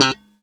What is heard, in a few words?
c4 staccato, glowly bass